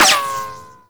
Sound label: Exalted Sniper Ricochet; Warframe; Frumentarius